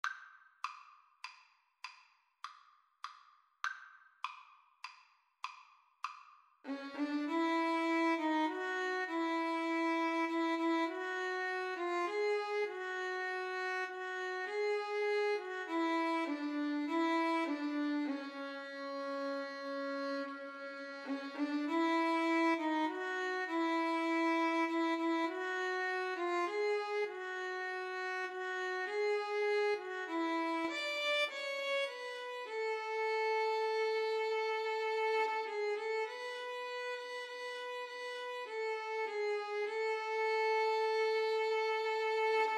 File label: Violin Cello Duet; rugged cross